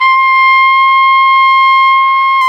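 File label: trumpet, harmon dry; Solo Brass; Trumpet 5-7; Tp 5 RCA Jaz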